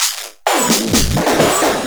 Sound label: Minimal Fills; Minimal Loops